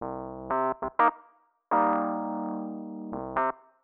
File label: 125 Rhodes C; Keys; PowerFX - Nu Jazz House